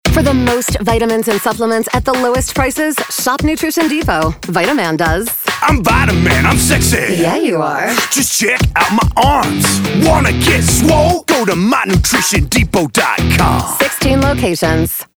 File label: Jingle